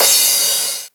Crash, Cymbals; Vengeance Samples

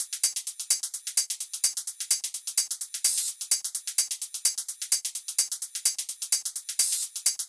128BPM Kit; Vengeance Samples; Fatman Kit

